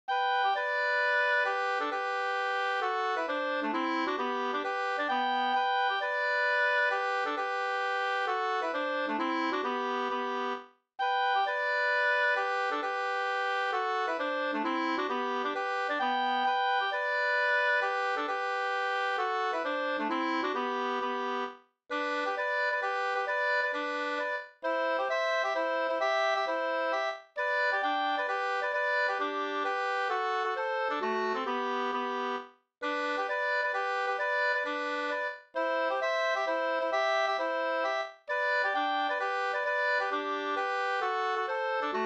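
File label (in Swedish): polska, tvåstämmig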